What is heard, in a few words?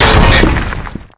charge hit world